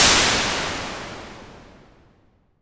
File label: impulse